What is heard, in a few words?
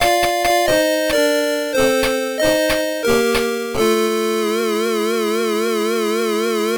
Music Game Over; Sonic Chaos; SMS GG